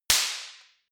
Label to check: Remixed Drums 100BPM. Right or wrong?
Wrong